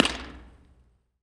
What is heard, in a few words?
Switzer St Under E Harbor Dr, Impulse Response, Underpasses; EchoThief Impulse Response Library